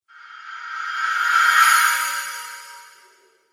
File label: Reverse Cymbal Whoosh